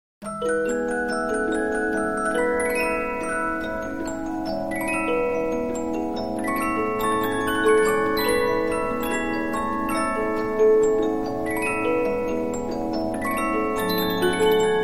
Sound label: Polyphon, 15inch disc musicbox